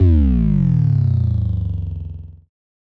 Bass Drop; SoundsCrate

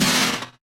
lightsword lightsword clash; soundscrate

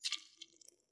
blood squish

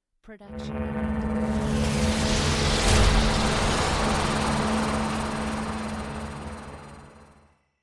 designer magical hit; SoundsCrate

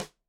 snare rimshot

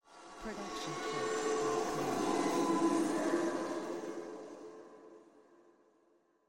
scifi eerie groan

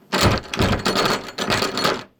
struggling to open door; soundscrate

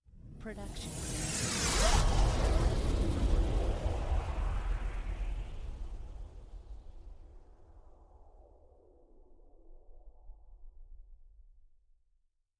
Airplane Riser

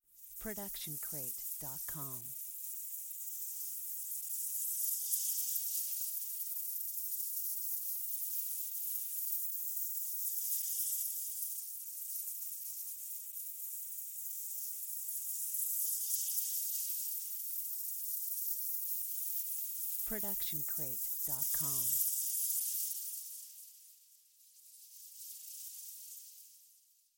Magic Portal; SoundsCrate

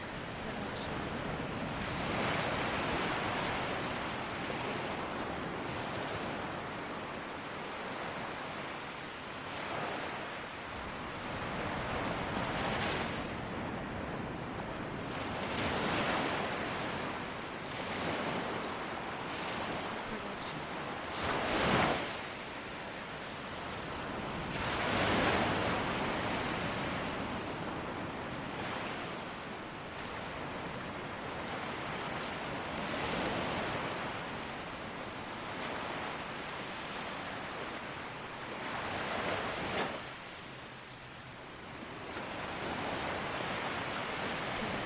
loopable waves on beach light; soundscrate

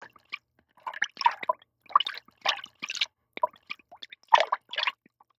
water splash; soundscrate

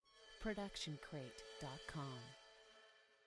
crash cymbal 16 inch scrape; soundscrate

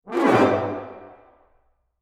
tripped up brass

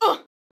woman injured grunt

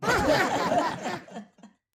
audience laugh

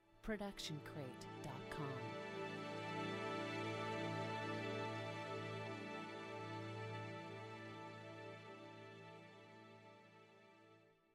feel good strings cresc decresc; soundscrate

